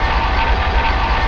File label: Scrape Hard; LFS